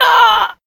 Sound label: pain